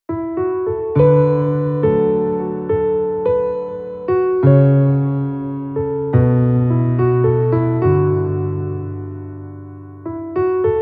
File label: Autumn Piano